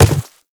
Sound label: Quadruped Medium Walk Dirt, Footsteps; Warframe; Infested